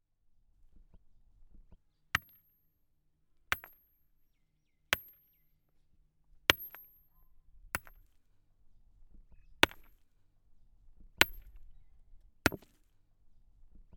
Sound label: Hammer Agents Stone